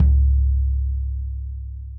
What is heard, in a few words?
FLOORTOM 1614 finger